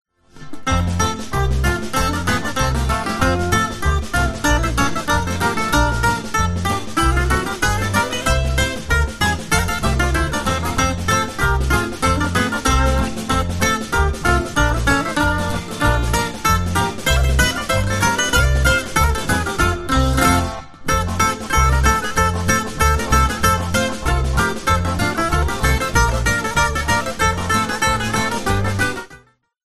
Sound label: jingle bells